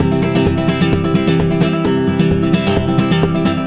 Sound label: 130 C Rumba; Spanish And Gypsy Traditions; Soundscan vol.59; AKAI